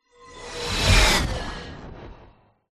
sound design cinematic whoosh impact futuristic sci fi; zapsplat